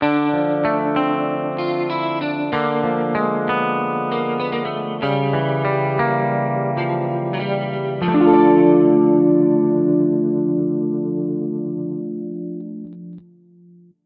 Lose; Project Reality BF2 1.6

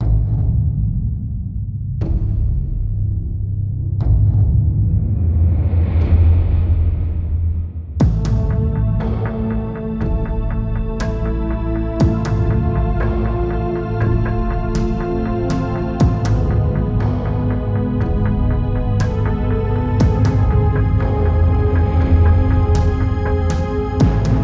GUI Music; Galactic Asteroids Patrol; macOS; Windows

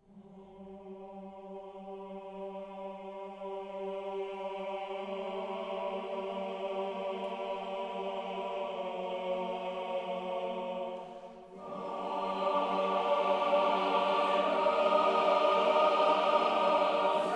Choir Only; Ascension Epilogue; starcraft ii heart of the swarm

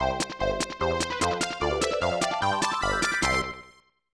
Ura Game Draw; Same Game; SNES

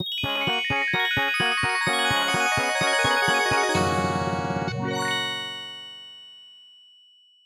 Fanfare, Roland; Apogee; Blake Stone Planet Strike; MS-DOS

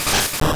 Bonemerang

